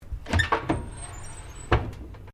OVEN WHIRLPOOL DOOR OPEN; Common oven sounds; Appliances; Household

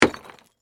Impact Rock Single; Materials; BlastWave

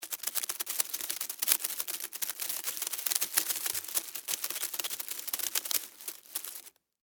Insect Run Tree, Insect, Run, Tree, Foliage, Bug; Blastwave; Animals:Insects

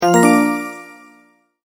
multimedia tone start

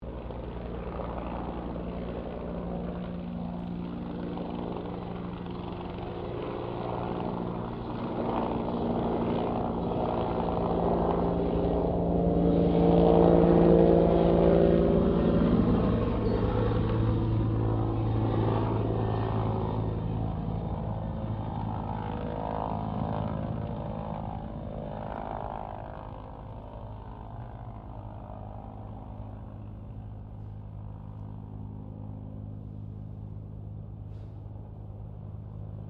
helicopter Bell 204 firefighter pass medium; Vehicles; Aircraft; Helicopter Sound Effects; Helicopters (Sound Effects:Vehicles)

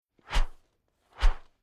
Plastic Tube Sweep By Left And Right Whoosh Airy; Whoosh Sound Effects

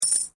Coin Penny Multiple Drop Stone; Common coins; Money; Objects

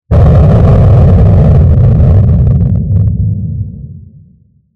Heavy Distorted Long Explosion; Explosions; CraftedSignals

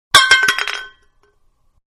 Small Can Fall On Concrete; Metal; Materials; Can Small-Falling Onto Concrete; Manhattan Production Music

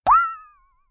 Hand Saw Hit And Metal Wobble Impact Cartoon; Warped

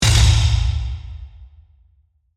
Hit Metallic Deep, Pound; Production, Element, Imaging, Accent, Transition; BlastWave

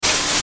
Static Noise Fast Burst, Static Bright Burst; Production Element Imaging Element Accent Transition; Blastwave; Static Sound Effects

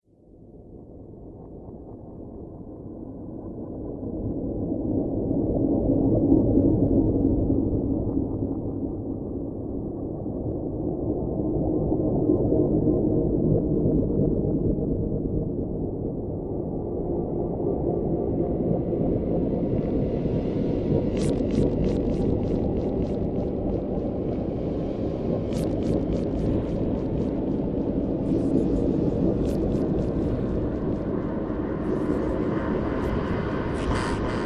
Soundscape Dark Memories, scary soundscape; Scary Soundscape Sound Effects

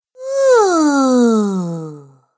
Cartoon Girl "Oooo"; Cartoon Voice Sound Effects

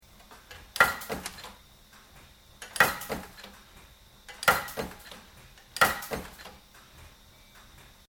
Industrial Machine Metal Punch Operation Sequence; Various industrial machines; Machinery

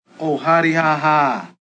Oh Ha Dee Ha Ha, Male; Talking Sound Effects

